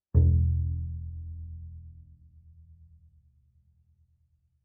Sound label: Bass pizz ff sul E E1, Double Bass; MIS Pitches - 2014; Strings